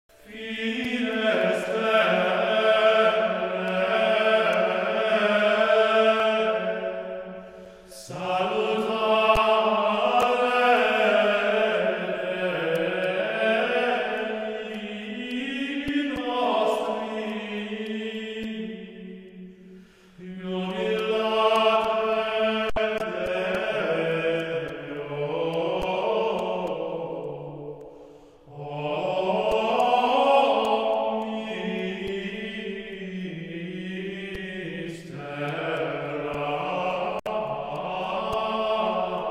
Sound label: organum quadruplum; Perotinus; Viderunt omnes